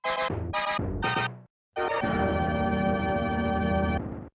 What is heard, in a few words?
jingle; Neugier